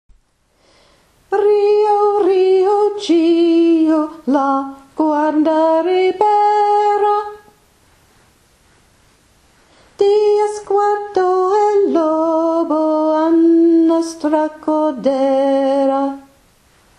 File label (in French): voix soprane version lente